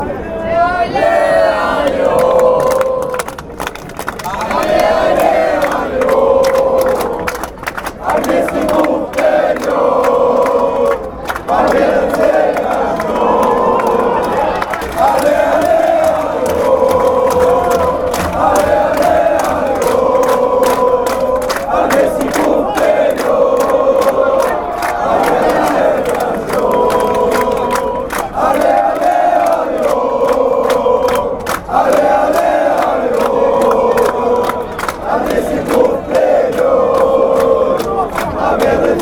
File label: cska chant fanchants